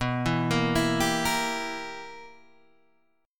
B Major 7th Suspended 4th Sharp 5th Guitar Open G, chord